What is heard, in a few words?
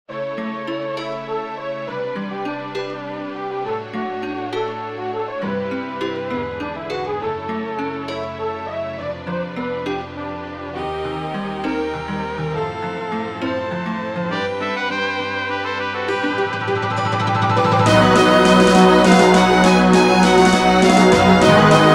ending, midi